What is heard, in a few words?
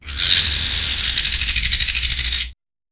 spinosaurus sound, dinosaurs